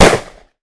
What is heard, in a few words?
unload, weapon; weapon hitman; izanagi 2022